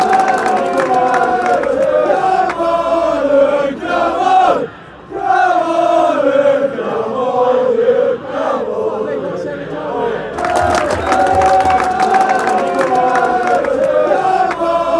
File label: come on luton auld land syne, fanchants